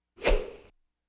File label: Swish Cane Thru Air